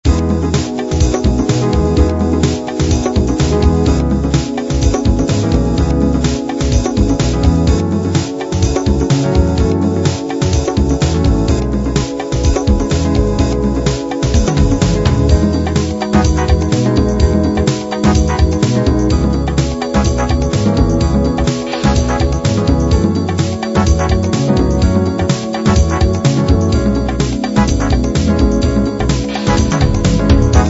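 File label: acid jazz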